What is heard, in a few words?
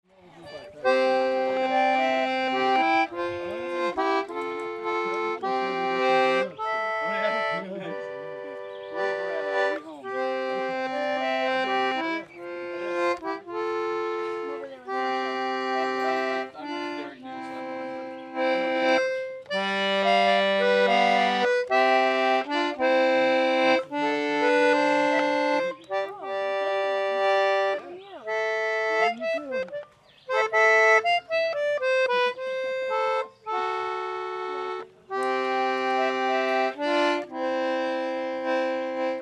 Acoustic; Dublin; Wisconsin 2019